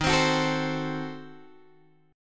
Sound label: Eb Diminished 7th Eb, Guitar Drop D